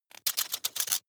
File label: metallic rattle, robot; comedy cartoon